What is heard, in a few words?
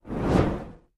Torch Movement; torches